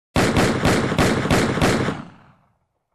Multiple heavy gun shots

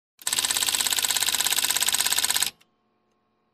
Electric Sewing Machine

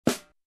Snare Drum Hit

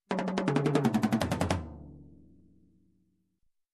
Rock Tom Fill

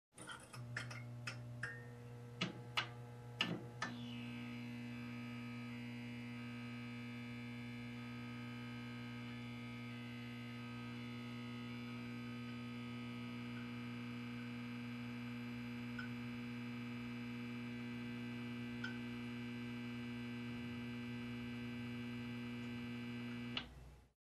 Switch on high voltage buzz

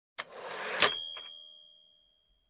Cash register bing sound

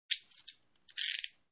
Handcuffs clicking and locking in position

